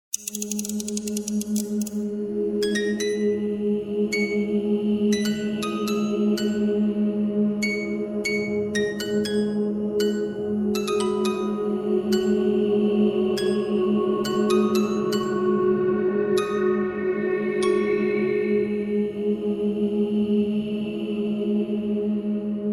creepy horror orchestral sound design

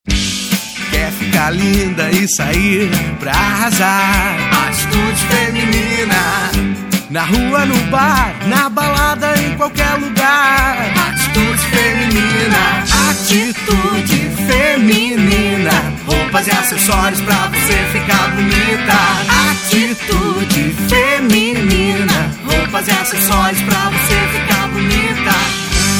jingle; Estúdio Gavetão

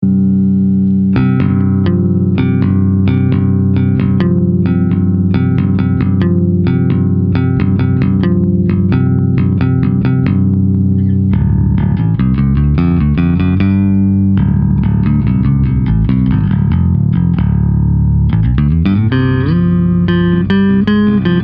bass solo instrumental

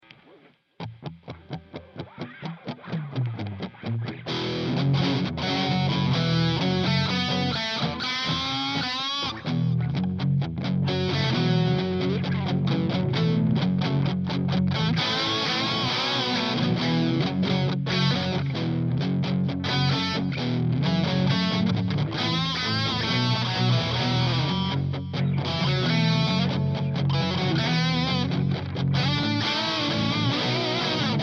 riffs base

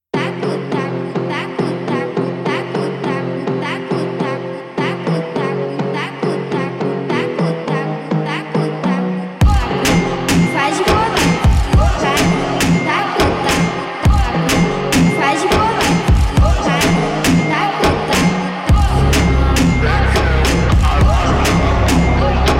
brazilian phonk slowed

